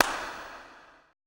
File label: E CLAP, ETH PERC